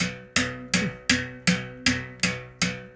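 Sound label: BS BERIM GRV, BERIMBAU; Spectrasonics; Heart of Africa 2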